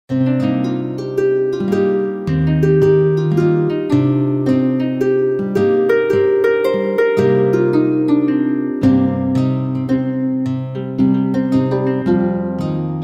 AMBIANCE ROYALE

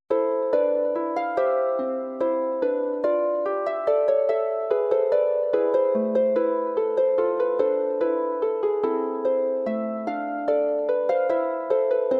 SARABANDE HARPE; DE VERSAILLES

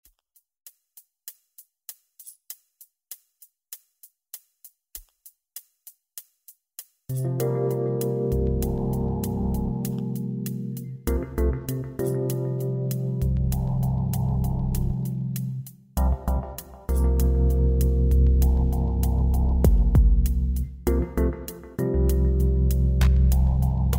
ambiance rendez vous

